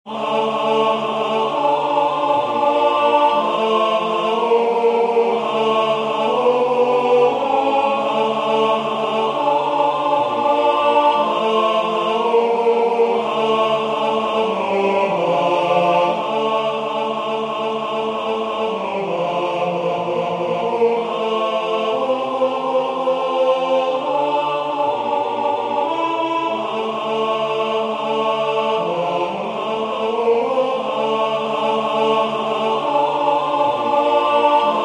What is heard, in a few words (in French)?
Benis le Seigneur o mon ame tenor